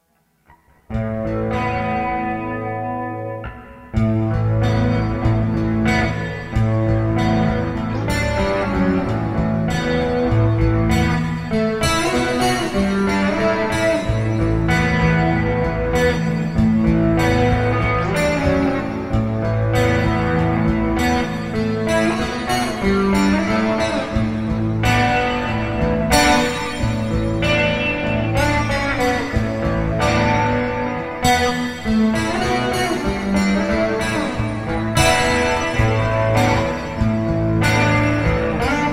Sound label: 12tet in a; tuning survey